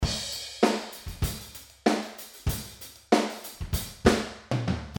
emotion example raw

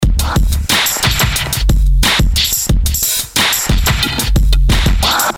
fuzz audio example

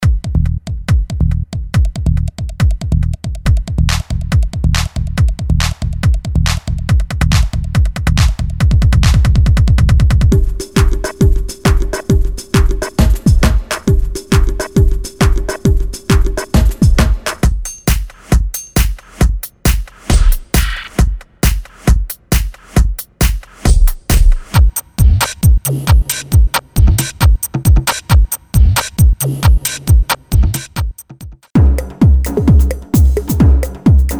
trance drum loops

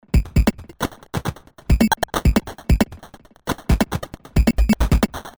slicer dicer

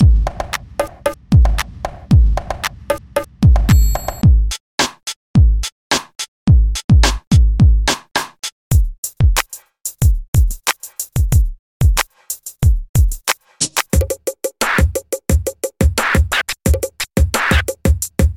electric dope beats

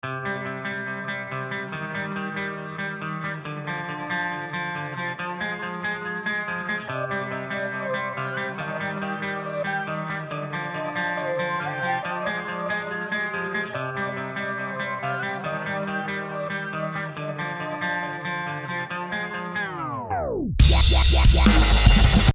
intro with vox

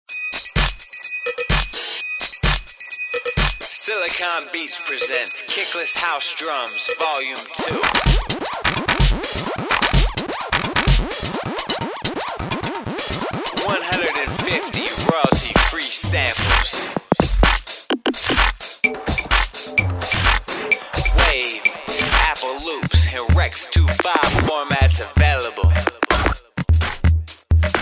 kickless house drums

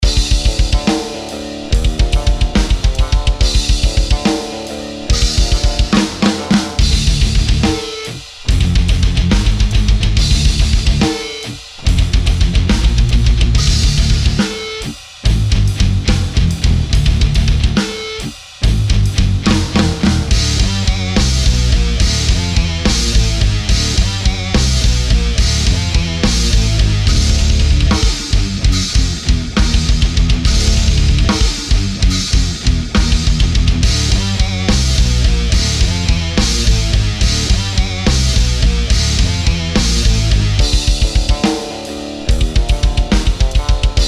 metalcore drums

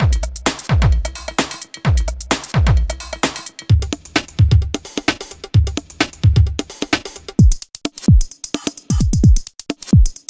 electronica

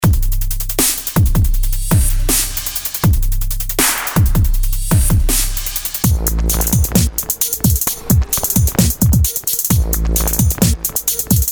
dubstep breakbeats

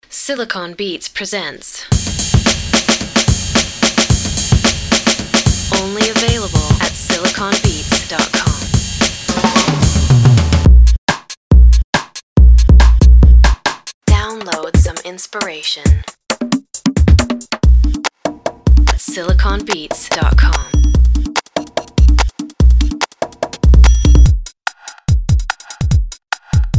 killer breakbeats

